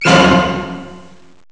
orch hit

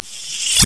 Monitor Up; Idea Source Seven

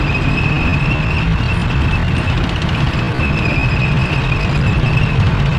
Medium Tank Run; 1944 Battle Of The Bulge

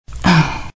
Female Moaning; Survivor The Interactive Game; Player

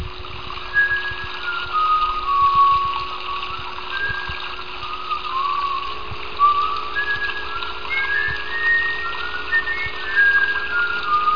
Bkgrnd Sounds; Himbo Park

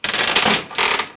slot; Microsoft Graphics Studio Greetings; Microsoft 1998

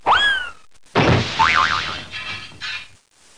Crashes; Bible Fun Pack; Education Publishing Concepts; NOAH

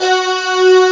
violin

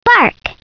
BARK

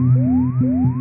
atmos; Ultimate Games No. 2 (1995); Weird Science; Amiga-CD32-CDTV; dr strange

